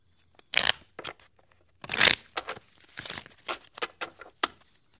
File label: new game, interface; Blackjack; 21 game